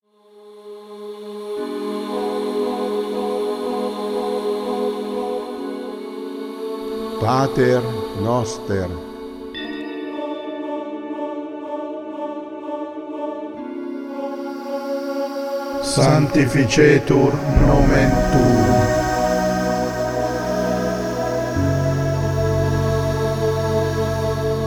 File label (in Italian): canto